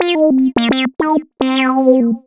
lead (brass); Sonic Foundry (Sony Creative Software); Ugly Remnants VOL-2; Leads

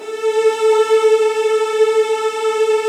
A2, Virtuoso Strings

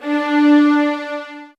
SOFT VIOLINS

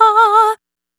Stigmata Dry, vocal samples; Sonic Foundry (Sony Creative Software) - Pocket Diva World Class Vocal Samples; Op-Erratic Loops

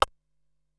Vocal Percussion, Drum Machines Ensoniq SQ-R-Plus; 300 Drum Machines

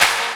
CLAP HOU, Dance Mega Drums; Best Service

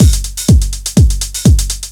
hum hats etc, Deep House 125bpm

